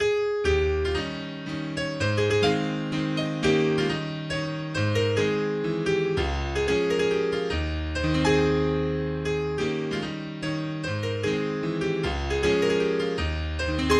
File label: Mazurka in A Flat Major Moderato con anima